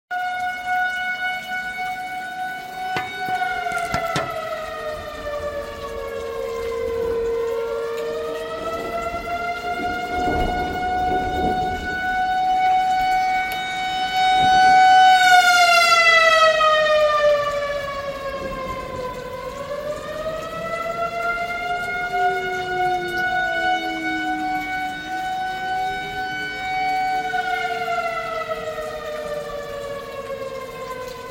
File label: weather siren united states